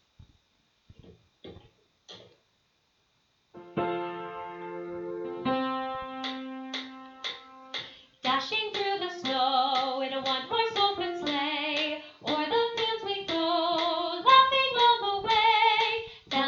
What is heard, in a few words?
Bell Medley; yuletide 2017